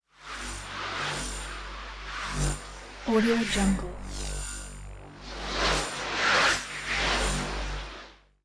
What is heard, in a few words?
electric zoom bys singles groups